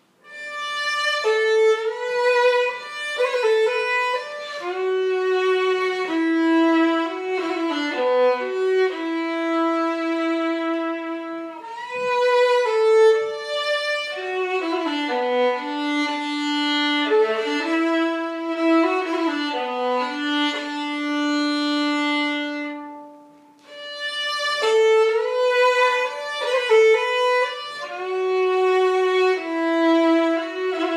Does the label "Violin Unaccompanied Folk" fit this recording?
Yes